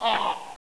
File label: hurt